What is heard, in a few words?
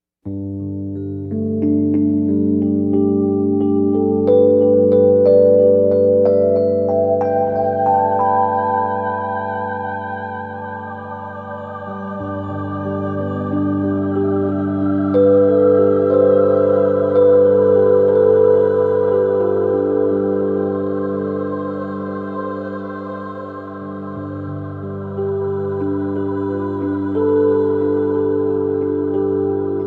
music yoga